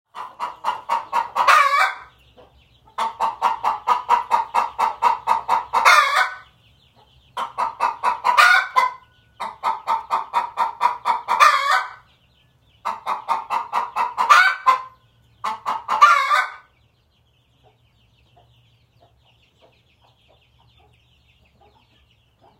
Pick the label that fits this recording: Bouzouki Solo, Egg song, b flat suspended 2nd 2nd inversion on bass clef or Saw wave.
Egg song